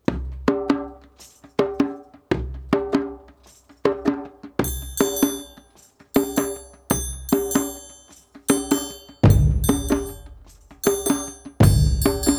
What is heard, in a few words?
Shaman beat